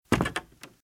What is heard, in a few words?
Footsteps - Wood - Creaky - Walk - Right